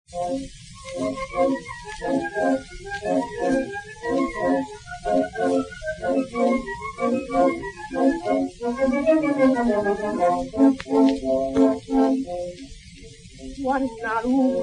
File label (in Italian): sirena; le sirene sono ovunque